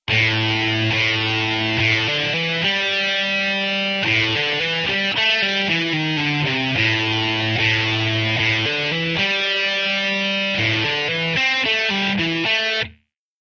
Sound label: Rock guitar; Total Rock gt